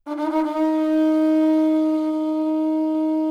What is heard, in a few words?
High Conch; Civilization Revolution